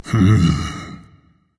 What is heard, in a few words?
thinking berserker; cuchulainn